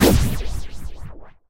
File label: dry fire; 奥利